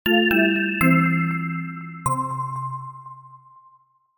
multimedia signature playful

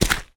foley footstep on ground boot dirt

